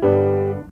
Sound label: Piano